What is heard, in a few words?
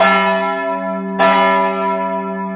bel; 暴力赛车